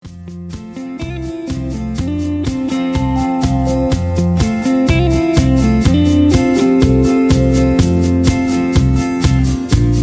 Instrumental No Leads

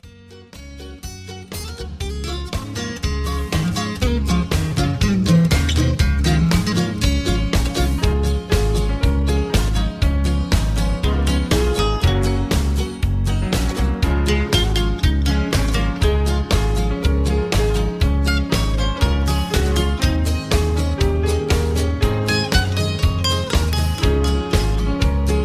Instrumental Lower Key; Singing Calls; Gospel; Imperial Records

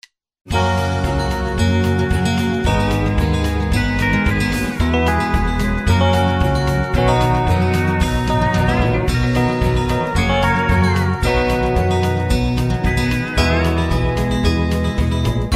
Low Key

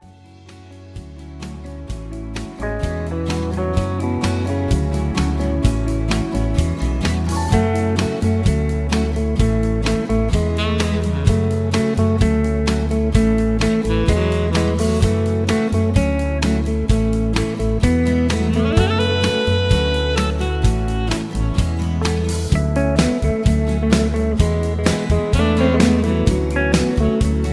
Instrumental with Leads

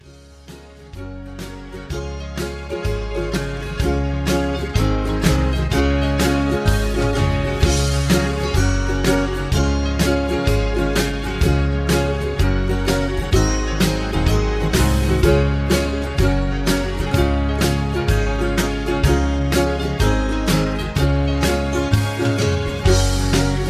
Instrumental Lower

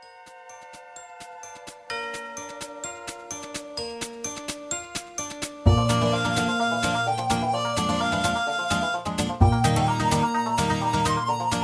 Instrumental

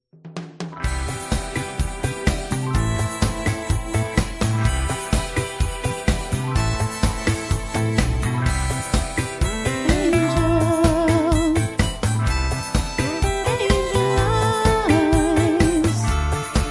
Instrumental with Harmony

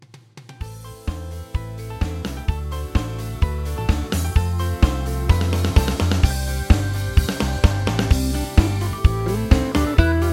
Instrumental

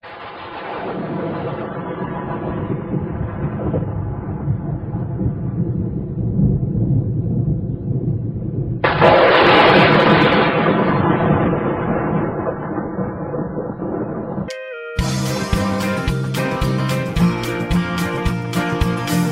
High Key

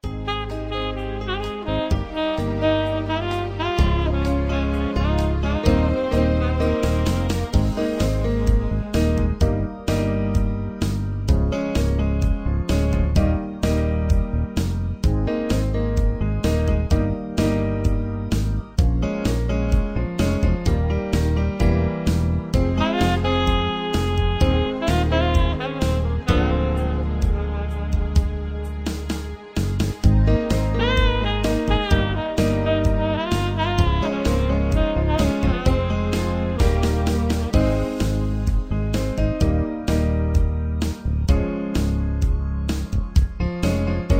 Instrumental

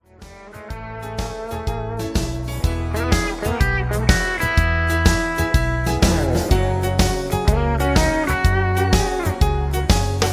Instrumental

